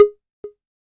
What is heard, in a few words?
tom, Korg Electribe ER-1; Kit 7